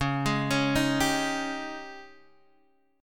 G sharp Major 7th Suspended 4th, C sharp

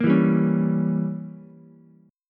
Bb Diminished 7th, Ukulele; strummed; chord shape; 3,4,3,4

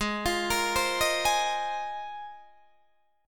Ab 6th Add 9th Ab, Guitar, Open D

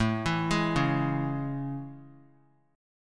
A Major 7th A; AM7; arpeggiated; JGuitar

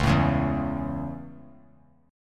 C sharp Minor Sharp 5th; C#m#5 strummed; JGuitar

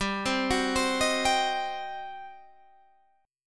G Major 7th Suspended 4th Sharp 5th, G

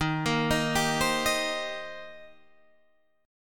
D sharp 6th, D sharp, down, Guitar, Open D

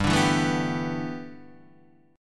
G 6th Add 9th G Guitar DADGAD, chord

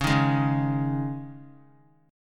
Db Suspended 2nd 3C,3F,3A,3D; strummed; Dbsus2; JGuitar

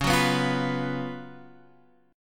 F sharp Major 7th Suspended 4th C sharp

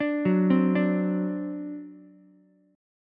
C Suspended 2nd Flat 5th C down Ukulele; Csus2b5 arpeggiated

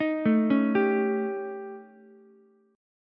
D sharp 7th Flat 5th, D sharp, down, Ukulele; arpeggiated; JGuitar

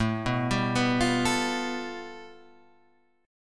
A 7th Suspended 2nd Sharp 5th; x,x,7,4,7,6,7,6

